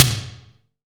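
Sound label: SDS V SNR, snare; Best Service; Real Mega Drums VOL-1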